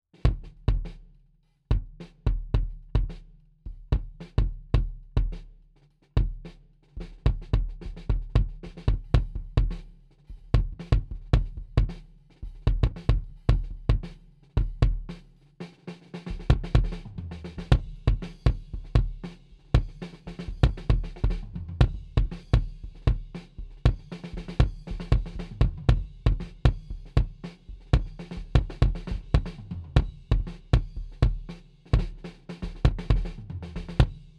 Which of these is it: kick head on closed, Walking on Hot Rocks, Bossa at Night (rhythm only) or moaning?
kick head on closed